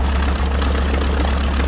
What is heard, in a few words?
out air; Mafie 1